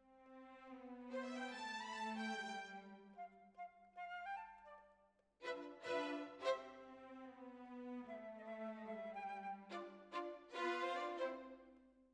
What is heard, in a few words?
Violins, jazz for piano